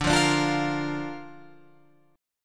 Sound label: D Minor Sharp 5th D down Guitar Open F; Dm#5 strummed; JGuitar